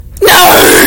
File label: earrape